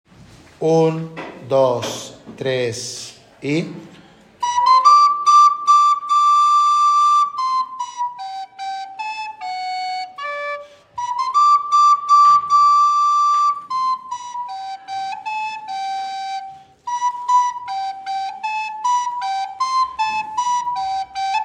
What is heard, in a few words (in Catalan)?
Flauta, Flauta Dulce